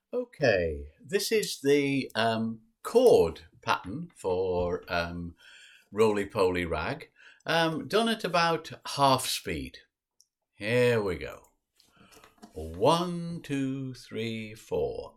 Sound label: Strum; brewkulele autumn 2021